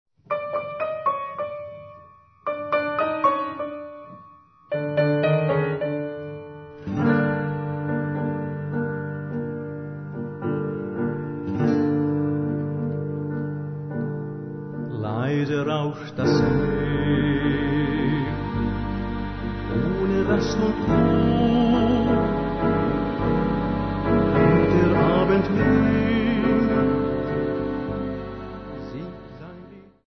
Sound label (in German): gitarren